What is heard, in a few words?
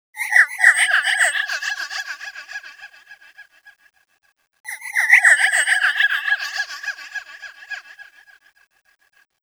GROOVY; USB Soundscan vol.33; AKAI; Disjointed Textures; RYTHME